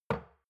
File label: shot glass place table soft; berufe; gastronomie; soundpool